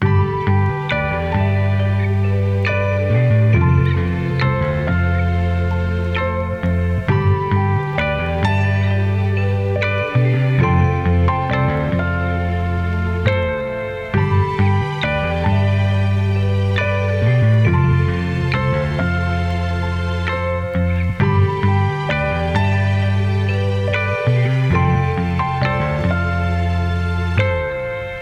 Piano, Bass Guitar, Strings, Horn G 136bpm Vintage Vinyl